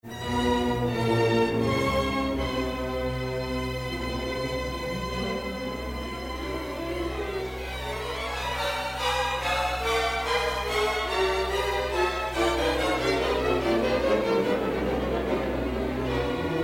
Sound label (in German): Streichorchester; Inventionen zu BACH fuer Streichorchester, Nr. 7 Sekunden